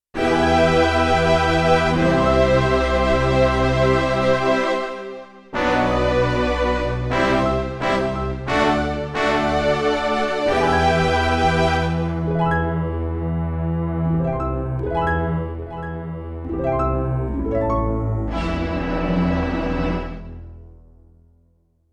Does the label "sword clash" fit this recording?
No